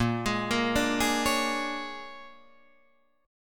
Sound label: A sharp 7th Sharp 9th, Guitar, Open D